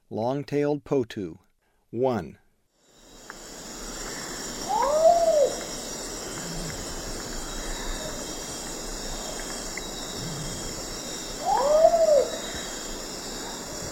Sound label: Long-tailed Potoo; S. America; Eastern Lowlands